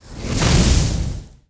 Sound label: BOSS Atk; Fabao